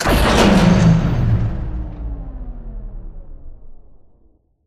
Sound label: Robot Arm Track Stop Mid; Boomtown; Titanfall 2